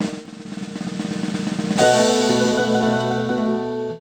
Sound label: Jingle Title loop; tamagotchi connection corner shop ds